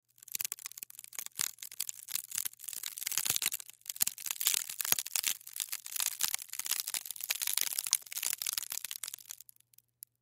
Woven Basket, Woven Basket Stress Crackle; Crackling; Foley; Objects; Blastwave

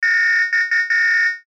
DATA READOUT STUTTER; Digital; Sound Design; Multimedia; Blastwave

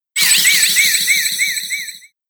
Space Zoom, whoosh; sound design

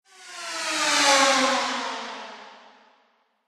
Space Bike In Tunnel, spacecraft sound effects

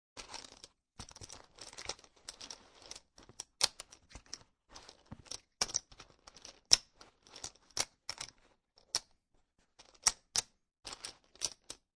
Poker Chips Collecting From Table; Poker, gambling, chip; Chips clicking

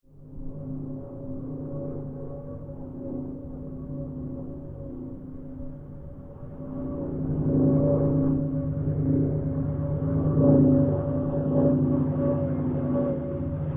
Kittyhawk Boomerang pass, Airplane; Mixed aircraft squadrons; Airplanes (Sound Effects:Vehicles)

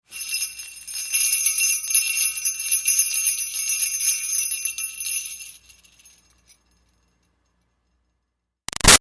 Bells Sleigh Shake Jingle Moves; Bells (Sound Effects:Musical); Big Room Sound

